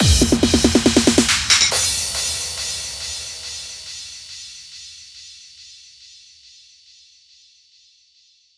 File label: Fill, 140 BPM; Pitched; Vengeance Samples; Vocal-Hook Kits; Song Kit; Mr Big Boom